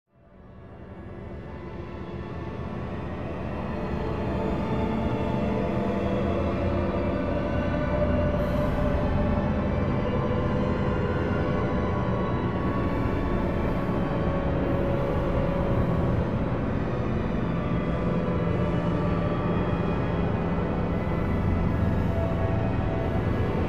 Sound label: Symphonic Cacophony - Deeper; Hollywood Edge; sound designer's toolkit v2